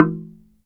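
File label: Slit Drums, hard; Roland LCDP11 Africa VOL-1; Wood & Metal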